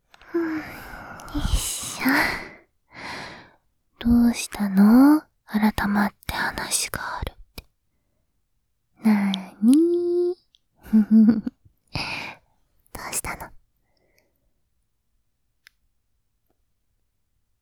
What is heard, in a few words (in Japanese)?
耳舐め, ASMR